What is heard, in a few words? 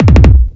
kickdrum